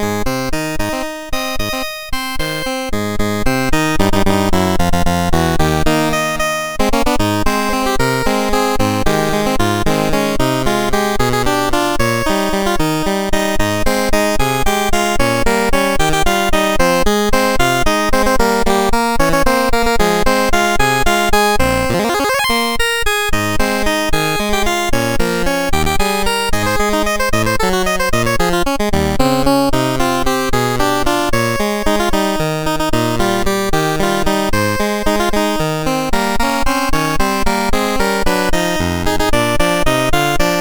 Wiener Schnitzel Waltz, MOS6581R3

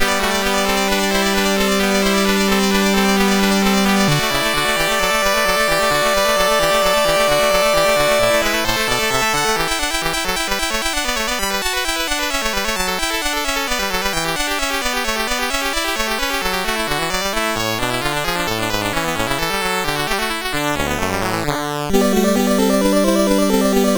Prelude and Fugue, CSG8580R5; WTC Mono; WTC Bk2-Prelude and Fugue No15

